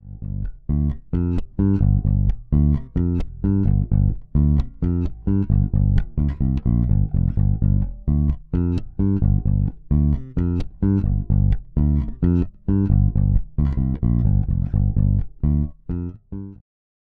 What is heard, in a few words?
Lakeland 55 94 USA Deluxe Both Pickups